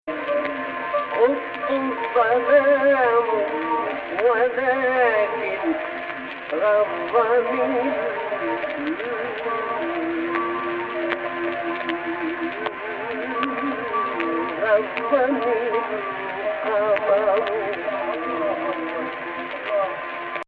AHH, Egypt Rast

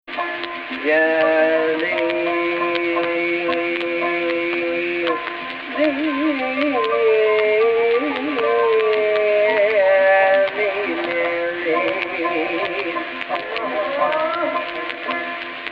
AHH, Egypt Rast; Jins Rast

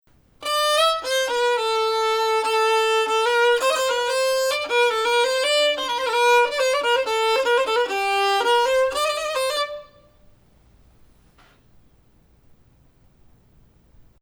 Bayati Samai Husseini Rast; resolution of Husseini within this phrase; Husseini 5 - Rast 4

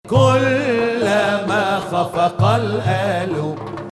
Ajam

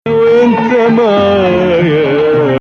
Nahawand

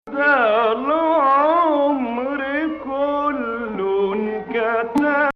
Hijaz Octave; Hijaz 8

